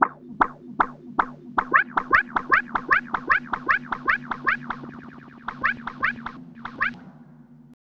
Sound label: Rhodes FX; EZRollers